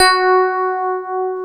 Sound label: PIA DX E-PIANOS